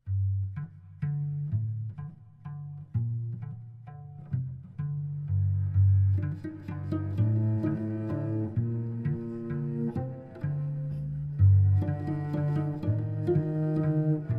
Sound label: pizz